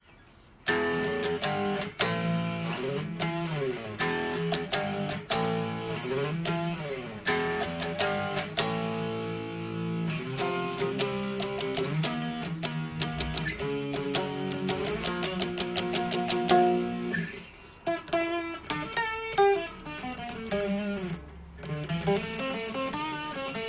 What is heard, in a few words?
Gitarrero A